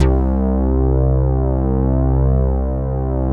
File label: Reso Synth; Best Service Gigapack 1